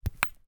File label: sport petank boules metal ball land in sand hit another ball